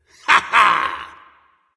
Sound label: yay; smite; hades